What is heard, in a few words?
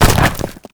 Colossus Hand; Warframe; Colossus Boss